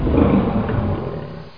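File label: dino roar; Pamela Anderson Interactive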